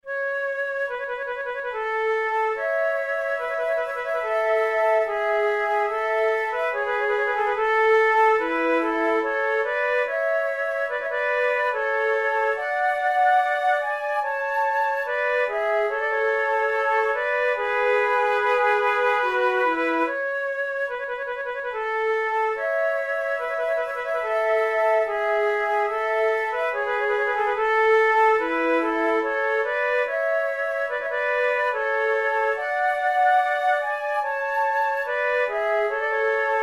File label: sarabande, flute